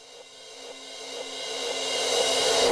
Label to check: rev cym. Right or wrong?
Right